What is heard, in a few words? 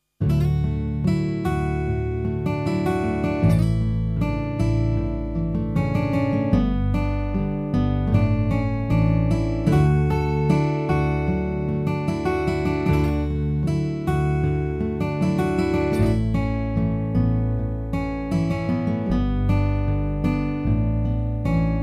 Solo Guitar